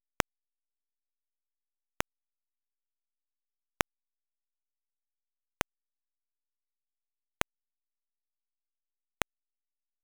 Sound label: Noise, LoFi Noise; DIS Noise TY1 - LP; Roland BK-5